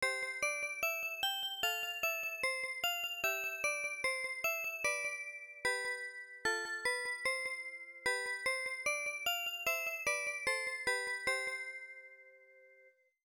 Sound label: Music Box; Dinosaur; FM Towns